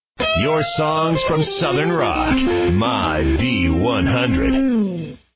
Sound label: southern rock